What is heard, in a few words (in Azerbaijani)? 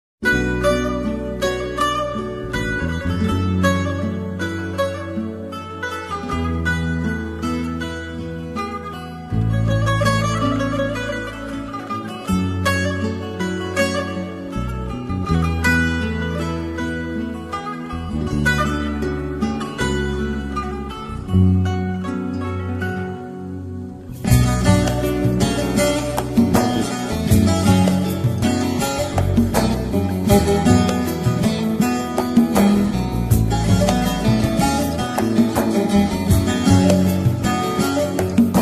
fon musiqisi duygusal; fon musiqileri